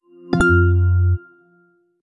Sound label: iPhone 17 Pro, iPhone Boot Chimes, Chime